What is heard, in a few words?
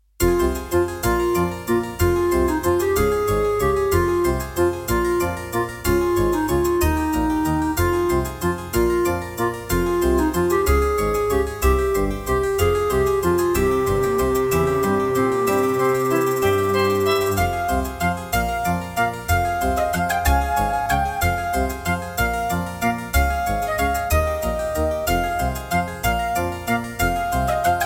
Track 6, CM32L ROM; Munt; Death Gate